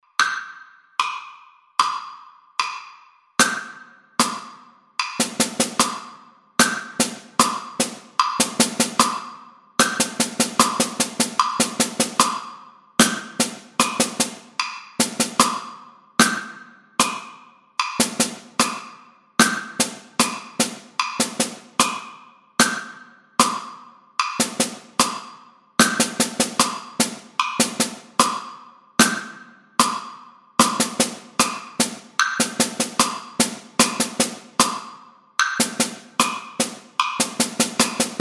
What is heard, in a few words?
drum set